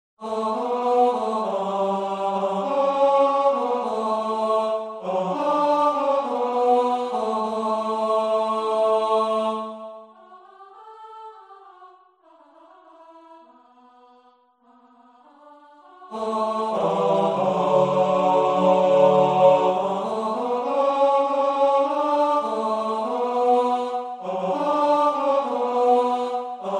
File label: Men